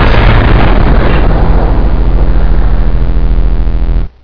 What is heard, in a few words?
explode; zm ilc silent hill